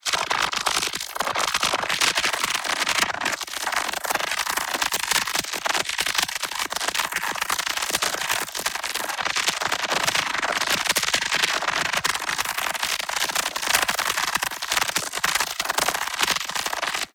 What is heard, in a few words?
ABILITY CHARGE Tiny Oscillation; boom library; Matter